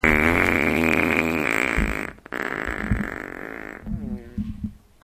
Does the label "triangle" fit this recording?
No